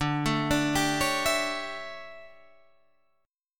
D Major 7th Suspended 2nd Suspended 4th D down Guitar Open G